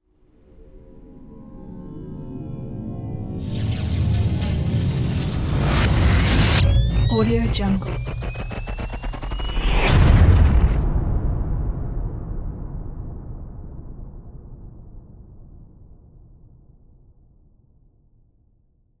futuristic time bomb

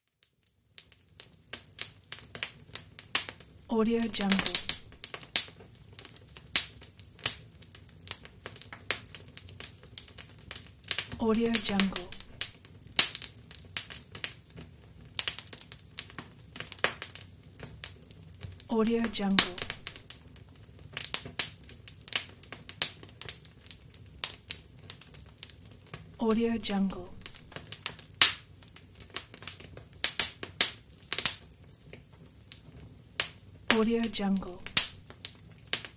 fireplace; pure-noise

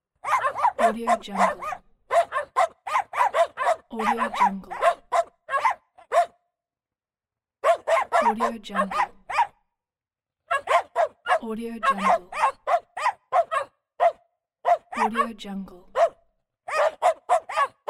barking dogs

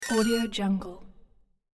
ethnic down interface

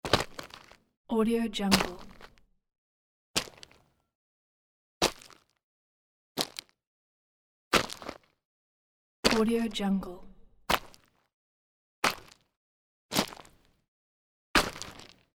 footsteps on gravel pack

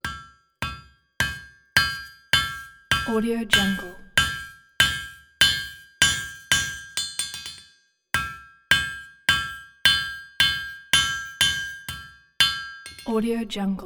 forging metal on anvil

